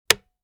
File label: switch